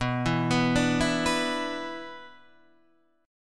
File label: B-Minor Double Flat 5th, Guitar DADGAD